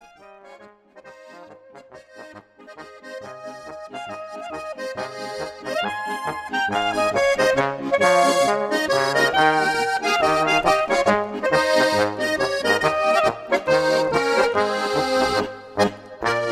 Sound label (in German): Polka